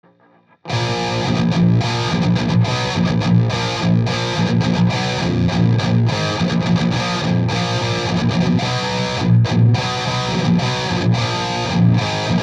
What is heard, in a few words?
drop a chugs r212 sm57; Calif Rect 212 V30; Guitar Cab Impulse Responses